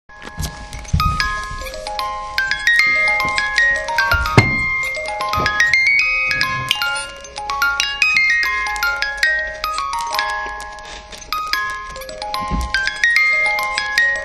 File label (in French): boite a musique